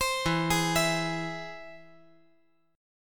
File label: E Augmented